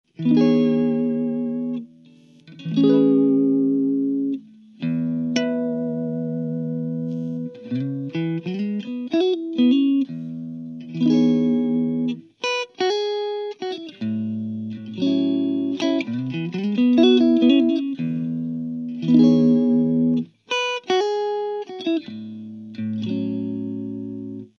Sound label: Strat Clean